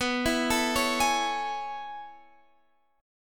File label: A Major 7th B; arpeggiated; JGuitar